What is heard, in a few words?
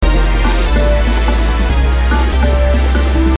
loop